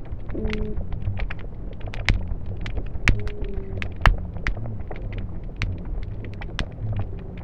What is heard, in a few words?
shrimp black drum, underwater